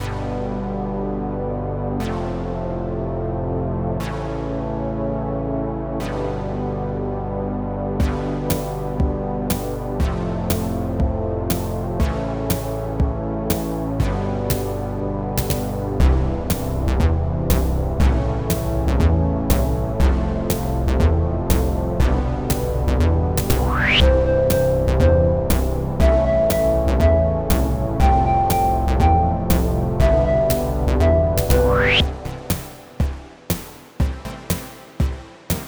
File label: synth test; weeklybeats 2024